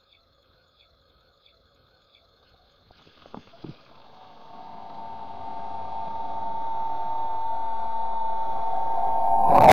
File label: clock ticking backwards f, whispers